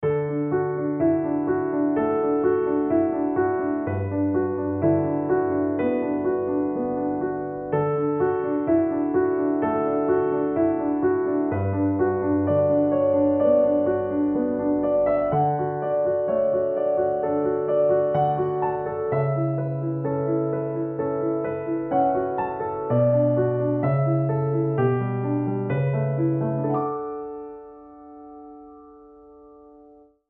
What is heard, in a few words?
Piano